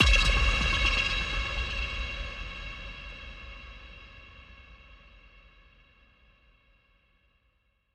Silence

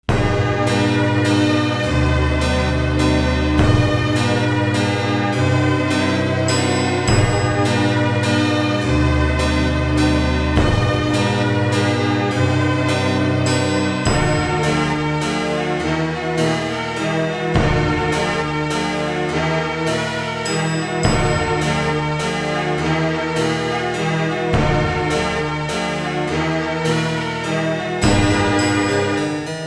The Organ; beyond shadowgate; Icom

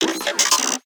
robot hunt

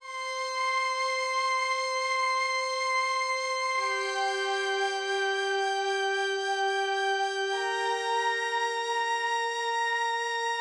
Pads; super crush k.o.

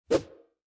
Swosh; Fancy Pants World 1 Remix

